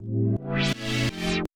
Fill Chord

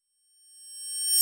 Hip-Hop Kit oldschool closed rev; Roland Juno-D

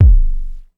Kick, Ensoniq ASR-X Pro

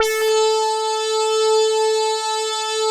OBERHM OBXA BURST A3; Big Fish Audio - First Call Vintage Keyboards

